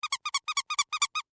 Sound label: Squeak Toy Large, Squeak Squeeze Chirp Multiple Airy; Foley; Objects; Blastwave